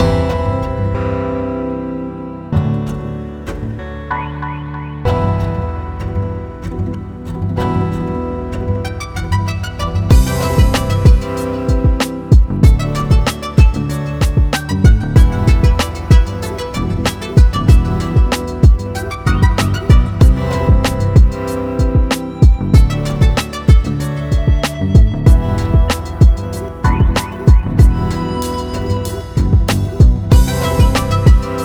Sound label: guitar vst chords resampling; thegathering19; fast music